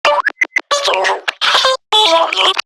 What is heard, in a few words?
Garden Drone Vocal